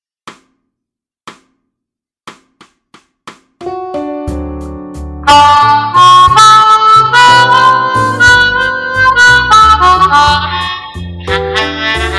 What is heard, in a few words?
slow blues in d, blues harmonica; g orange on vox s6